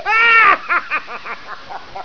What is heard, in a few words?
laughter